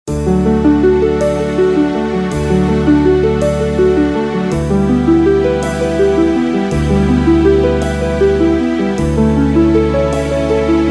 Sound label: D To E No Backing Vocals